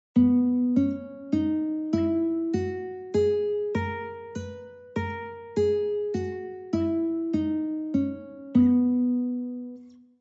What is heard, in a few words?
B Scale Guitar; California Music Conservatory